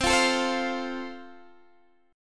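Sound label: A Minor Sharp 5th, chord strum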